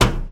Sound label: footstep metal low run; SFX; Environment; MONO; Footsteps; ProSoundCollection v1 3